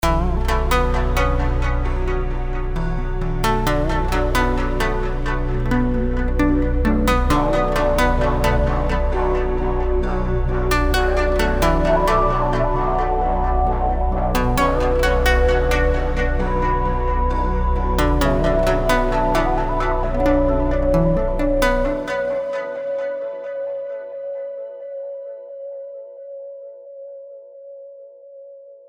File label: Avenger 2 Koto; Vengeance Sound; Generative 1; Mino